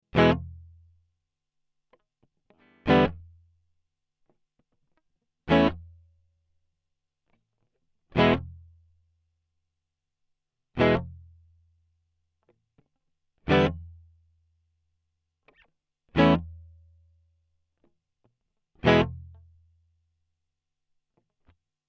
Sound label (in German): Binson Echorec E Gitarre ohne FX; T-REX